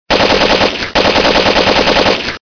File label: machinegun